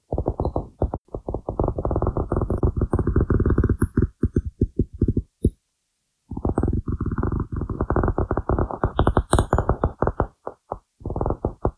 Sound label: Ice Fracture Roots Or Growing Plants